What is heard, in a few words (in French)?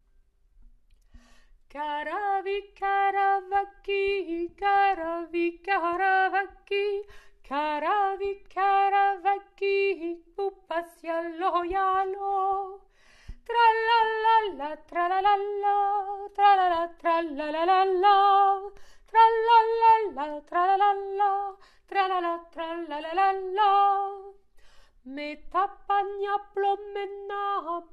alto